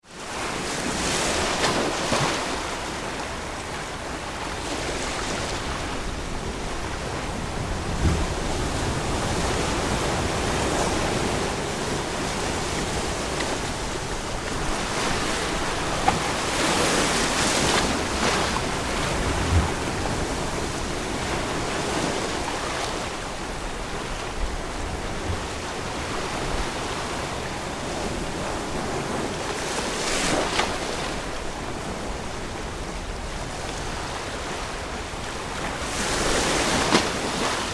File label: Ocean Waves on Rocks, Close rough sea onto rocks; Nature